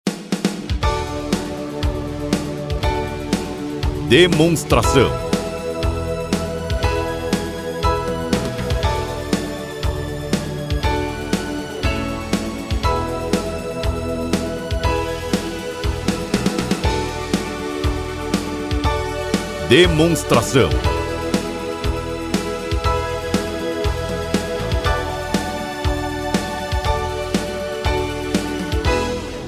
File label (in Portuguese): Trilha